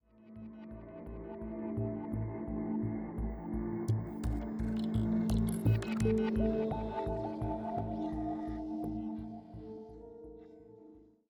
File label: 85bpm